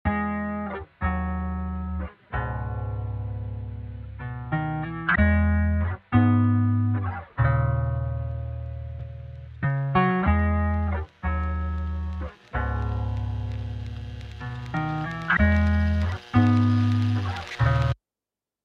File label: 94bpm Ab minor